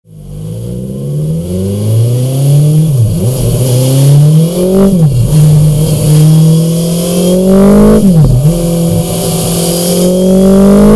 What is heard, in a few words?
BMW S 1000 XR OVALE, exhausts; 2021